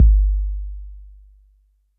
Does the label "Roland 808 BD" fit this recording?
Yes